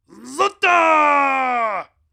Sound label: Summon Roar